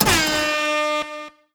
Cheers - Light Up; Grand Fest Tricolor Cheers

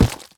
dirt hit; teardown ps5 windows xbox series xs gamerip 2022

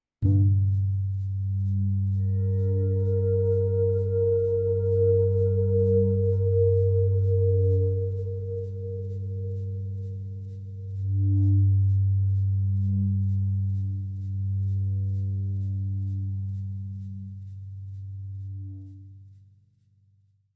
Swimming Pool; Insertion

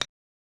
Putter Shot; Okamoto Ayako to Match Play Golf; SNES

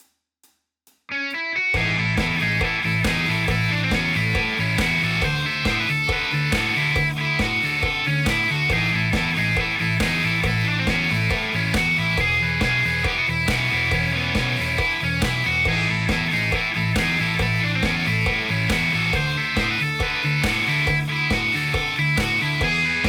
Blues Loop; Rizap for Nintendo Switch Taikan Rhythm Training

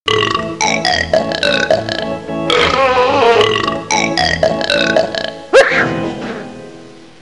Burp Song; the ultimate haunted house

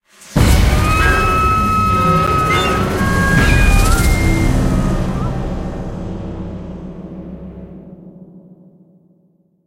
Terror Sting; Jurassic Park The Game

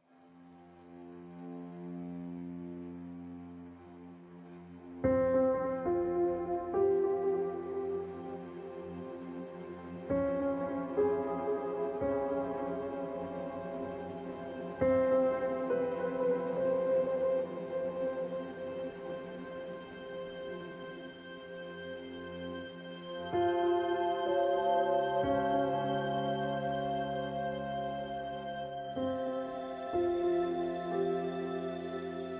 Cinematic Music Intro; The Park

